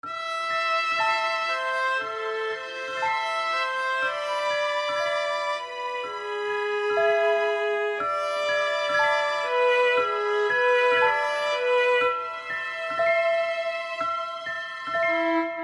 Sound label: Instrumental